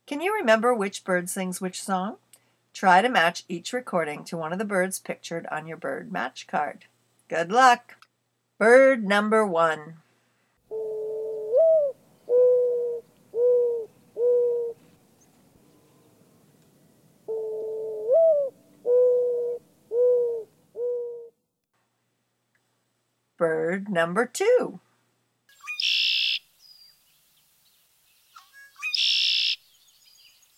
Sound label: forest birds bird mix; Peterson Field Guide to Bird Songs (Eastern/Central US)